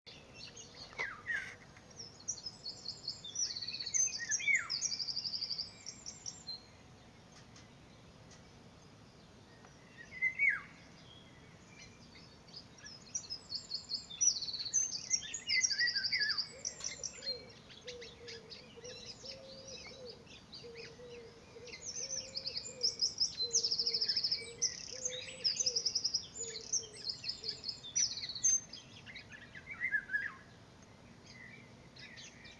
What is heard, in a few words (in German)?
singender Pirol im Bürener Garten